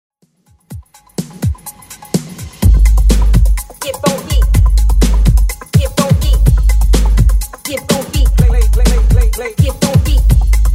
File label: 80s Old School DJ Intro Outro 125bpm